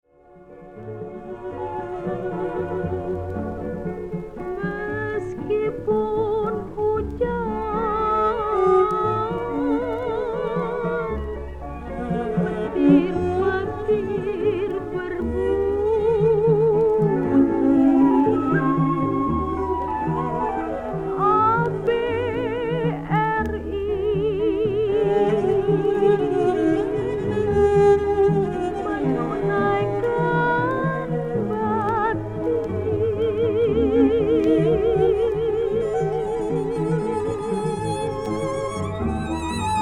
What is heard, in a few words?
krontjong beat